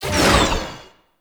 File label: Mirror Defense Rune Shot Cancel; Ambience; Gameplay; Warframe; Entrati